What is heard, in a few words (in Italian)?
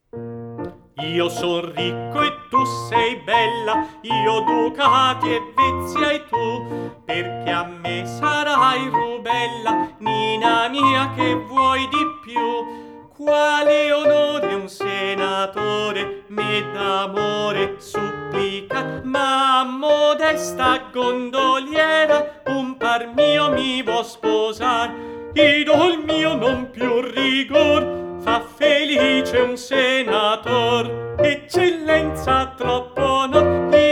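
Barcaruola a due voci cantata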